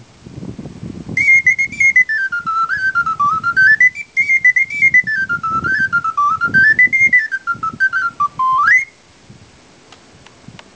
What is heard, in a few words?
ocarina